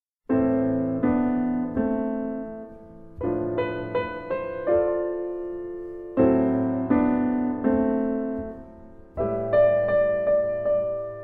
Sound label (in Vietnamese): Rung Do Si La Beat